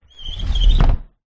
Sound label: household roller door close; zapsplat